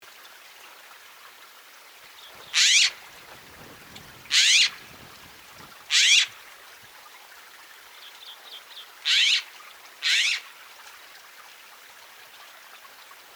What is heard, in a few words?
Woodhouses Scrub Jay, Aphelocoma woodhouseii; jays and crows